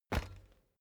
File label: bicycle street foot pedal